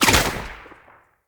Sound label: Bow Shot Charged; Weapons; Player; DendritBow